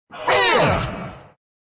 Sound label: pain